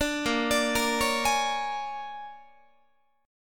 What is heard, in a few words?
A sharp 7th Sharp 9th A sharp down Guitar; A#7#9; arpeggiated